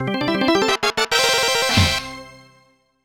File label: Jingle Record; Mr. Driller Drill Spirits; nintendo ds